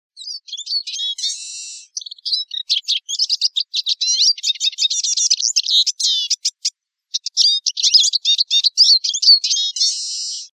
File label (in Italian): Lucherino